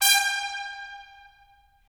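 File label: brass trumpets staccato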